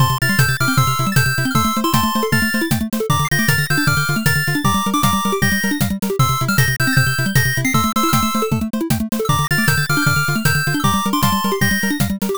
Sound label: bgm drive